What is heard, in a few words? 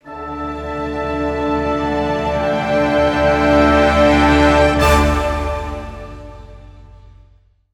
Win; Rise of Nations: Rise of Legends; E3 Video